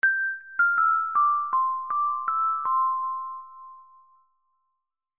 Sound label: xmas sms sound soft music tone